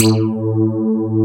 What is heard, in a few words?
wet bass